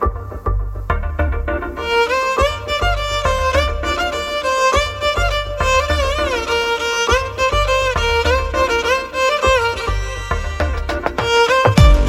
apple violin tone ringtone

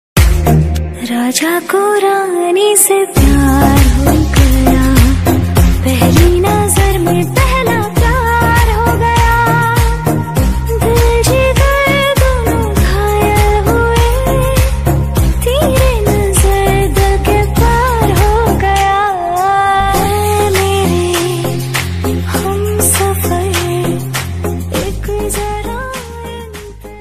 bollywood love ringtones

